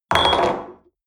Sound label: espresso upset table medium, restaurant cafe